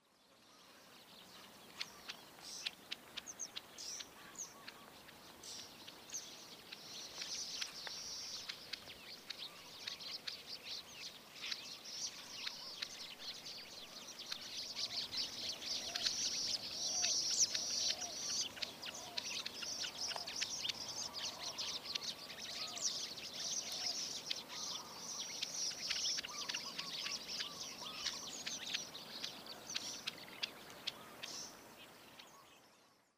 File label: call chack, thailand; kumphawapi lake sw fields; a.celmins